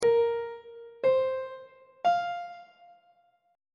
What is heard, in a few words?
b flat suspended 2nd triad chord intervals on treble clef